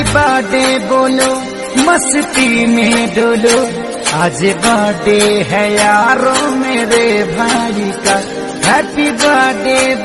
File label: Little Brother Birthday Song Hindi